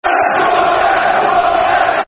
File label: Who Are Ya, Football Chants; Derby County Dcfc